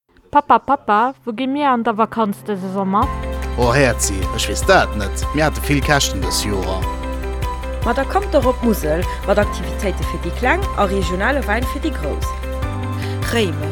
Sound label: Remix Ubung; Reklam-Mousel